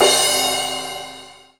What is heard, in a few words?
crash 2 heavy, Crash Cymbal